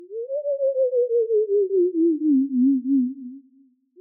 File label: tone; Halloween; seasonal